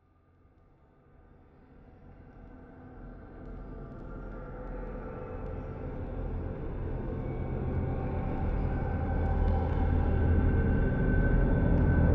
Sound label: bird larvae